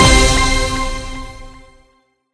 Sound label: Big Win End; Lock A Luck